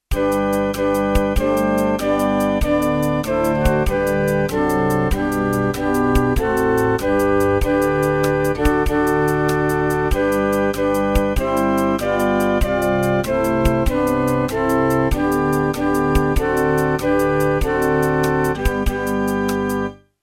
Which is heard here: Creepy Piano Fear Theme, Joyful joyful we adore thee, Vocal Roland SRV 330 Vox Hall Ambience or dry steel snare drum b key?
Joyful joyful we adore thee